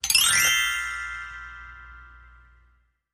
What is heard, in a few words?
Glock Zing